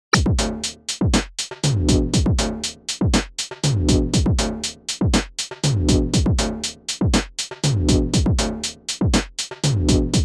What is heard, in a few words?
Drums